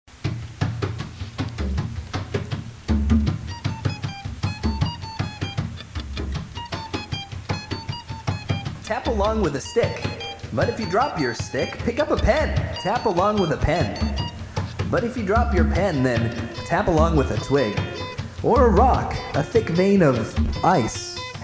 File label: Taps